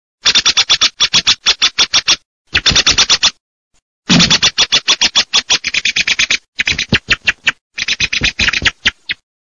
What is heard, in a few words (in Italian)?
TORDO